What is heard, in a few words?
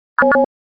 mail